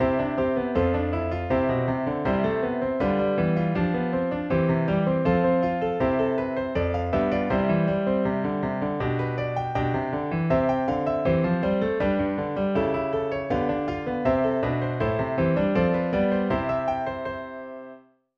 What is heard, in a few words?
happy variation